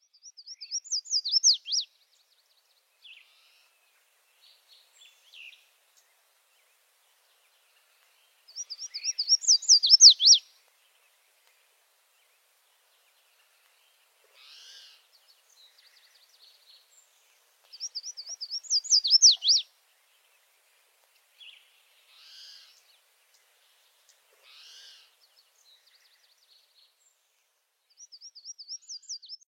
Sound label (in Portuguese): Canto do mariquita de cara vermelha